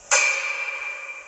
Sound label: hockey goal